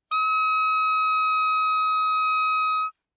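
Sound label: Soprano Saxophone non vibrato ff E6; Woodwinds; Bb Soprano Saxophone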